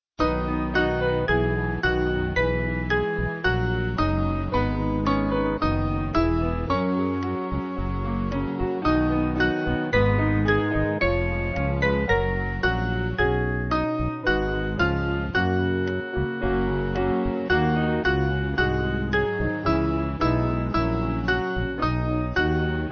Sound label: Band